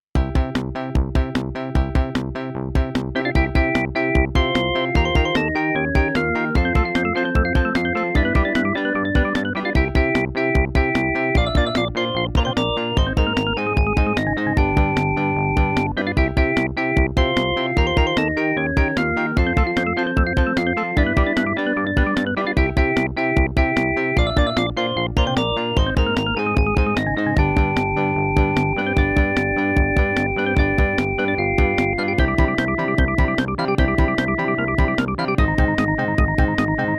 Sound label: imfc; IBM Music Fature Card; soundcard test; silpheed